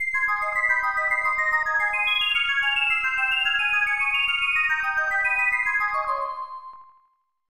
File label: Ringing the Singing Handbell; Down the World: Mervil's Ambition